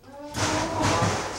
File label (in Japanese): 風呂場のドアの音